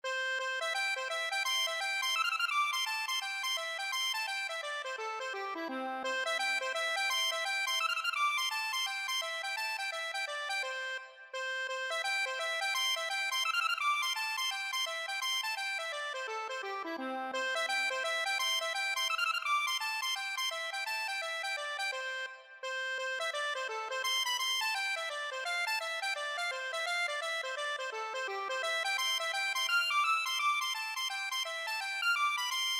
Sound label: higgins, accordion